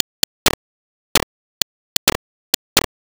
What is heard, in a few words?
Click Trick, Modular Electronica; Sonic Foundry (Sony Creative Software)